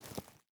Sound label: footstep burlap forest walk; Rust